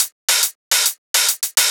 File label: Shuffled Loops, 140 BPM